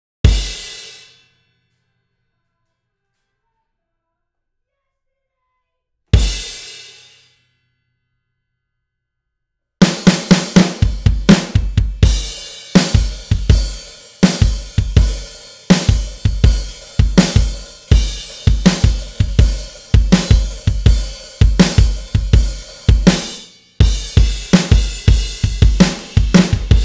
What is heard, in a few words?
drums